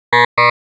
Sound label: shepard single trial up